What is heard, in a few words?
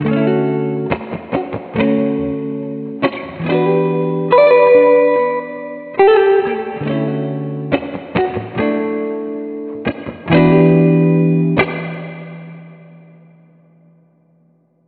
Soul Guitar